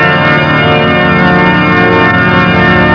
big organ; System7; Instruments

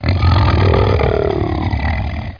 monst

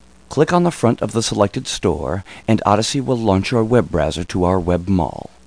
om; Odyssey Incentive Vacation Travel Planner

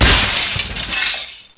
GLASS

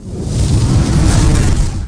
fire cast; DAoC; Toa; Gamestar

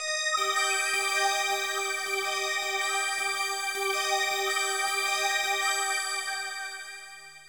SYNTH PAD AMBIENT 160 C, Ambient; eJay; Sphere

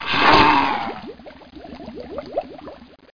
CREATUR

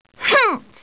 WRONG; QUIZ

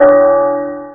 BELLS

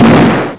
shotgun

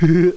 silly laugh; Acorn on the Moon; Langues

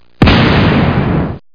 EXPLOS

